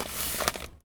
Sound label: Foley